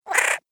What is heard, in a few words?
Cartoon Gremlin Baby, Cartoon Voice Sound Effects; Cartoon Gremlin - Baby 1